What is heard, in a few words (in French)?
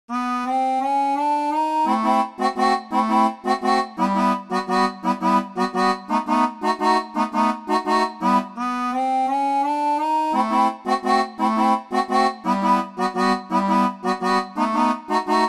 seraphone, orgue barbarie